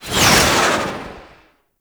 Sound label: Sniper Alt Fire Proj Whiz By; DE; Warframe; Frumentarius; Exalted Sniper